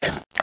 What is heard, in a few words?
CHEW, dogs; dogz